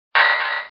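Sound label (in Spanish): Grito, Pokémon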